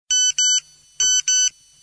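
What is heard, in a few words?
loud SMS signal ringtone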